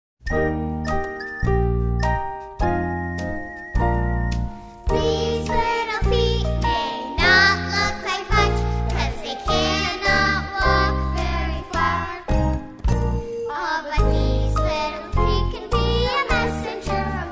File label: Music Sample